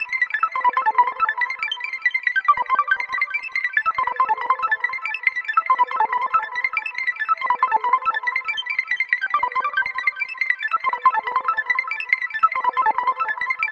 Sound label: keys